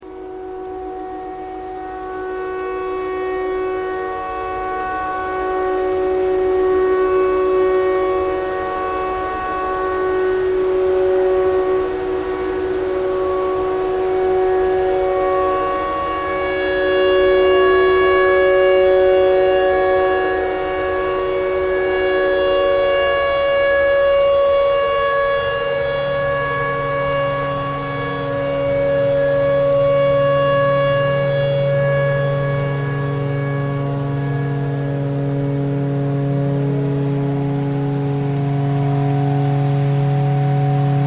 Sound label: tornado siren tornado siren thread simple